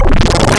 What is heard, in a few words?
Warpy II, Wavetables; Synthetic's Serum Collection; Cymatics - Nuclear for Xfer Serum Wavetables